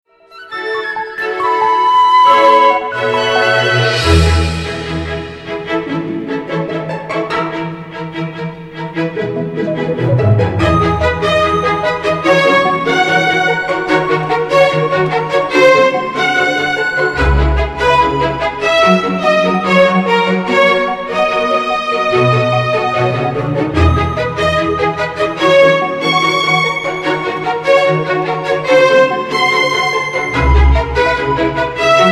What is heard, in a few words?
Intro Music; New Super Mario Forever 2012